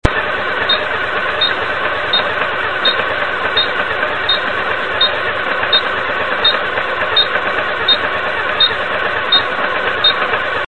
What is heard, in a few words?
winker buzzer